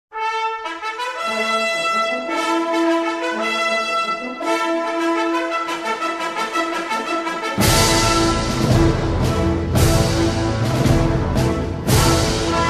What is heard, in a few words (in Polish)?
dzwiek ceremonii medalowej